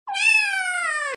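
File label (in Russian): zvuk myau kotenka, kitten meow sound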